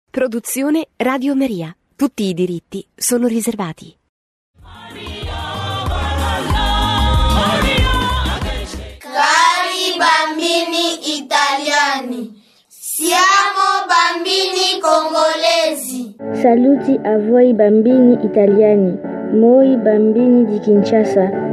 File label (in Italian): BAMBINI CONGOLESI